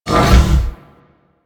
Large Door Open; Orokin

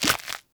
Agaric Mushroom Grow; Objects; Pickups; Resource Materials

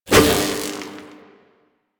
Whoosh; Warframe; Quests; The New War; Drifter

